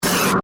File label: Static Burst, Static, Burst, Stutter; Production Element, Imaging Element, Accent, Transition; BlastWave